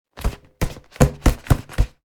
Inflatable beach ball taps hand hits

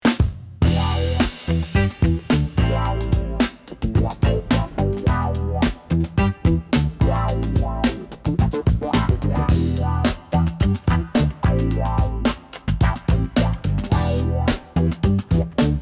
Funky groove chilled

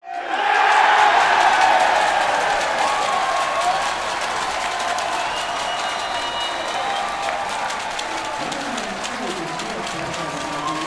Rugby match crowd reacts to touchdown

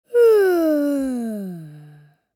Little boy tired yawn

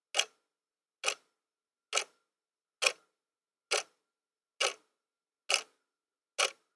Wall clock ticking

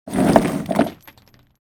Heavy chain dragging on floor

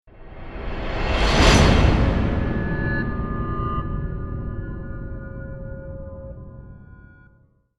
Epic cinematic impact

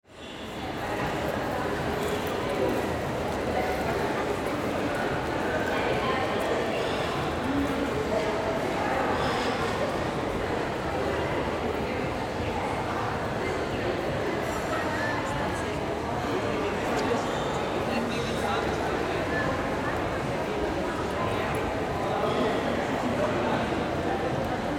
Shopping mall ambience large crowd

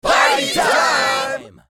Party time crowd celebration

